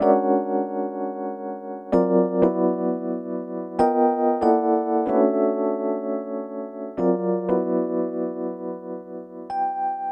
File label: EP, Keyboards, Electric Pianos